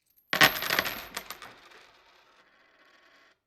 change drop on wood

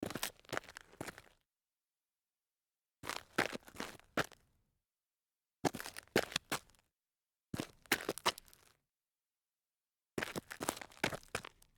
dress shoe sidestep on gravel

